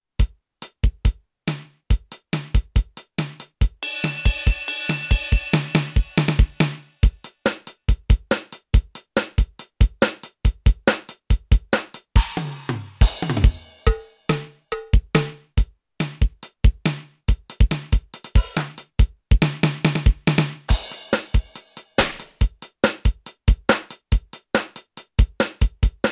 rock

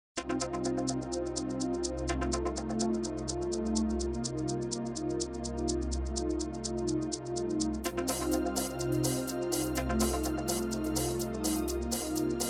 house

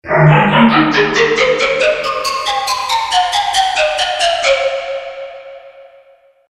Pan flute

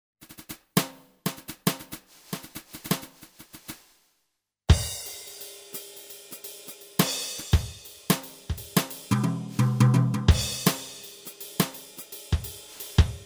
jazz, td7

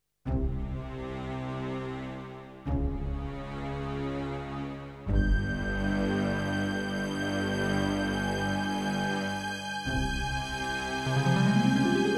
tv drama

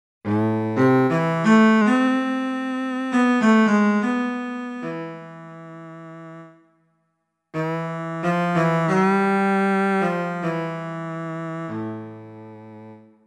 orche

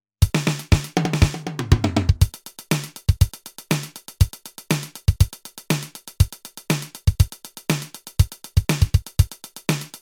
song

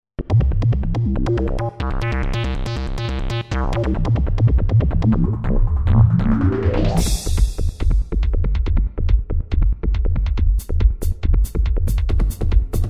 zapped

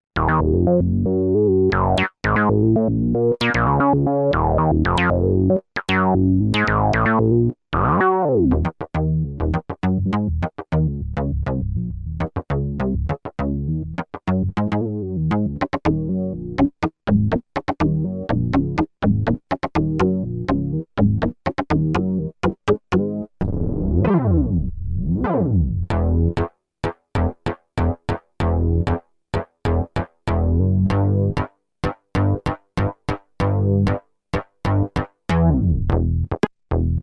bas

